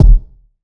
00s bassy bass drum sound d sharp key, kick sample tuned to the D# note